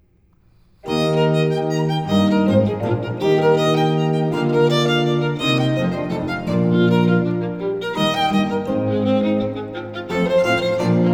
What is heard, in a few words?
Violin Sonata in F Major, III. Vivace; Corelli; Violin Sonatas Op. 5; La gioia; Lina Tur Bonet; Musica Alchemica